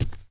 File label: Sphinx Walk; Playable Tutorial Level